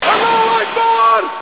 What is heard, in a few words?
bomb explode; RoyalSound